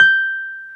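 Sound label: piano Wurly G6; Keyboards of The 60's and 70's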